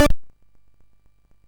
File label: sid MOS6581R3; Toobin